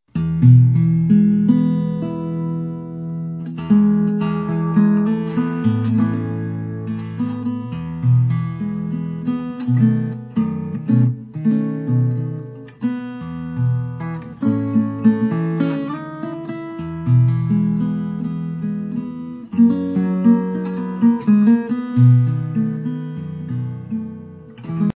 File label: Hymn Medley Parlor Guitar